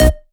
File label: Synth Shot; Vengeance Samples